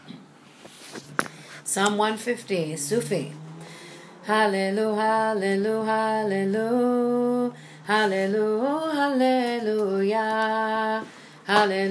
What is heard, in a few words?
psalm 150 sufi melody sung